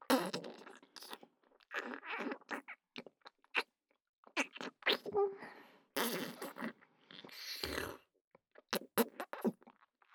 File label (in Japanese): 口フェラ ねっとり口フェラ